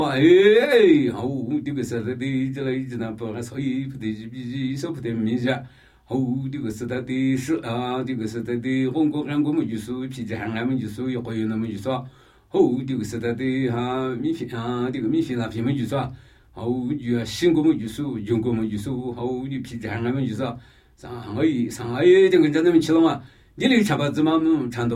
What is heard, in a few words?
Namuyi Tibetan Ritual Chanting; Phi tca pi su